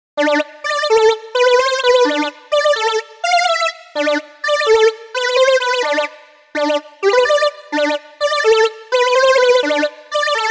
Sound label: String